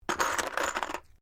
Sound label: COUNTERS PLASTIC GAME PICKUP; Casino Sound Effects